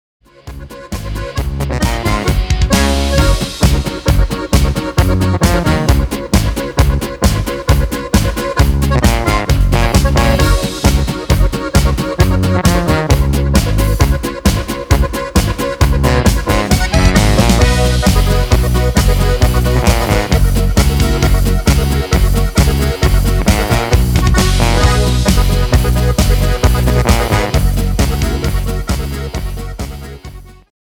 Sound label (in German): Hörprobe GIS CIS FIS H